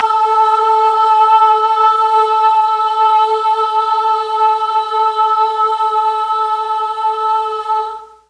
boys choir, mellotron

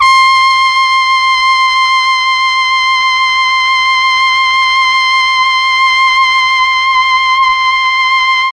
C4 trumpet, mellotron; CHMB TRUMPET

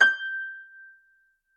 Grand Piano, Kawai, Sustains, G5; Chordophones

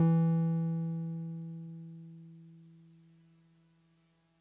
Piano 1 E2, TX81Z, Electrophones

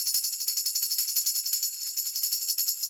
tamb roll Slow, Tambourine; Idiophones; Struck Idiophones; Tambourine 4

